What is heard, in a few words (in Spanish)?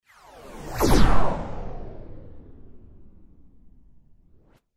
disparo